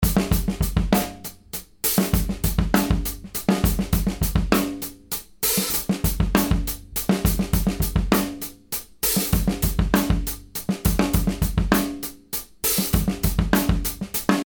Drum Loop, 200 bpm, 6 8 shuffle; drum loop packs

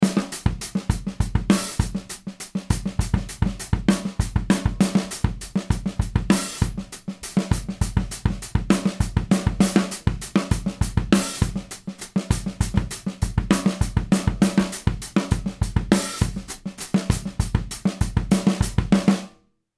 Drum Loop 100bpm; drum loop packs

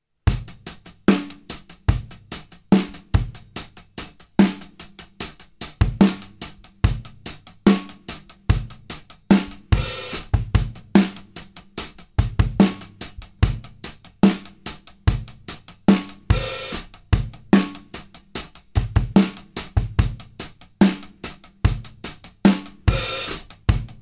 Drum Loop 73bpm 16th hats; drum loop packs

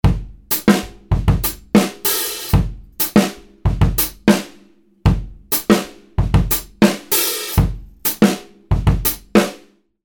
Drum Loop, 95 bpm, hiphop hats; drum loop packs

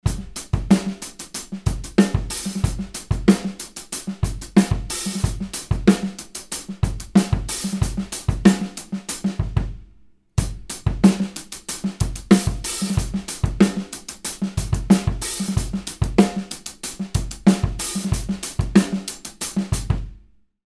Drum Loop, 93 bpm, 8 bar phrase funky hat; drum loop packs

